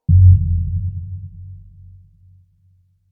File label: Space Style Ambient Noise, Eerie; Sci-Fi; Stuart Duffield